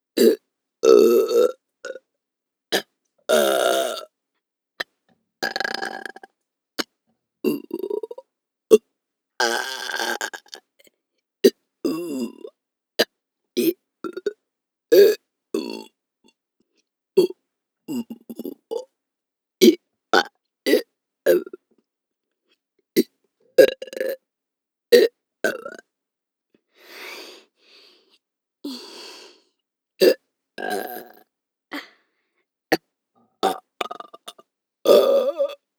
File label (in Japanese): ゲップオンリー, ASMR